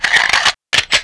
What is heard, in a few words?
reload; falcon2